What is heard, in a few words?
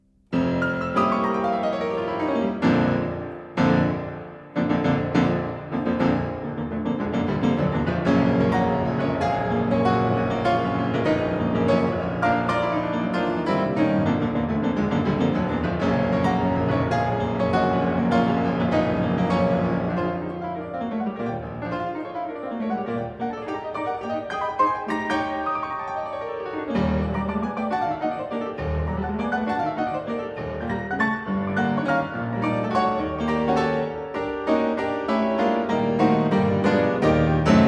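Piano Sonata No. 2 in B flat minor; 24 Preludes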